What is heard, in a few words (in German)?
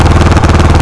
motorrad; akustik